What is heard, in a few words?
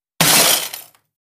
Glass Impacts Debris